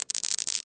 marble roll longer

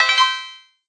chime

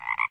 frog croak

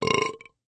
burp

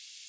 short slide

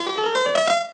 piano scale

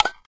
clack

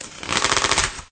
tear longer rippling

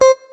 note beepey

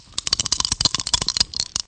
dice Shake

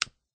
light click

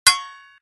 ting metallic

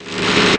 machine

